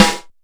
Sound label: TONE SNR